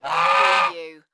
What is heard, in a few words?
Bird Goose Honk; sound-effects-bible